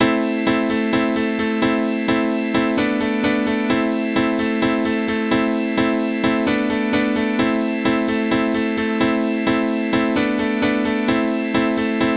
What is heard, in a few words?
The Organ